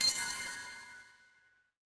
magic scifi heal

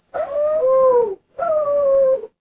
animal cat meow

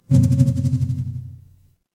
office phone vibrate

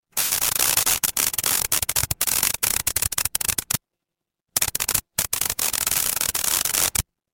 fire electric arc

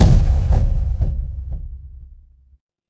magic scifi shield

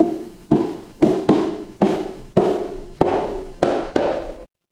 footsteps stairs

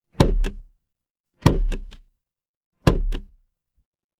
office stapler

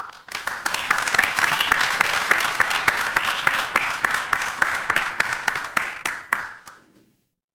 crowd applause

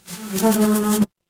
animal insect

